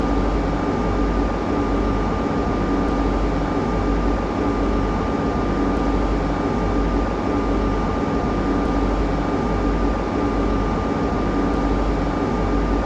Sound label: ambiance concrete